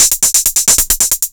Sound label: 808 tape closed hat